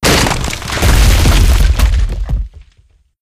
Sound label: random destruction; Source Engine; Portal 2